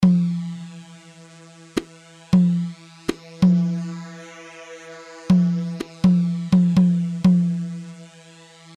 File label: Tabol D; طبل